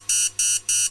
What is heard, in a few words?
alarm clock